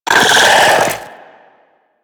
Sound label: Arachnoid Wraith Vocal Attack Short